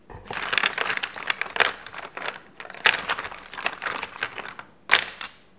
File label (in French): fouillage; khanat